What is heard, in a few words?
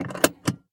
car seat belt click lock